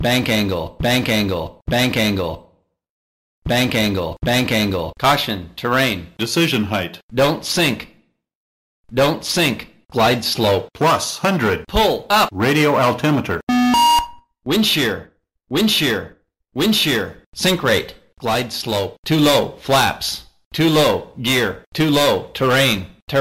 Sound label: T-CAS and GPWS 737